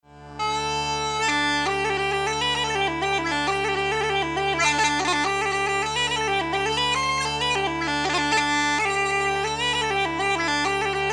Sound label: uilleann